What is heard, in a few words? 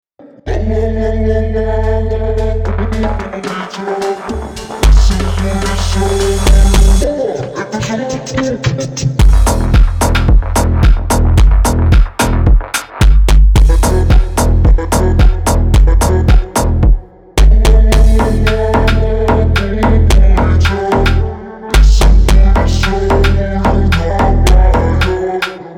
Brazilian Phonk Remix; labubu